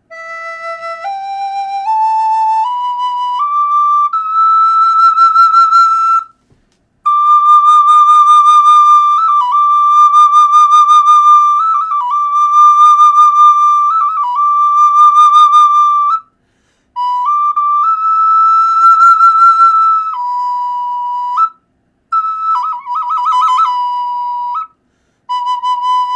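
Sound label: natural, flute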